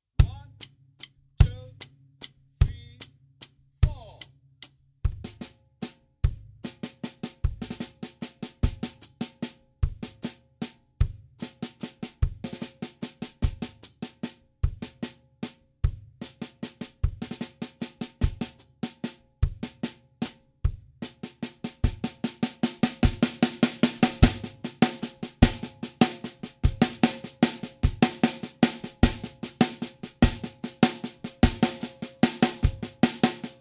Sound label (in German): Snaretechnik 75BPM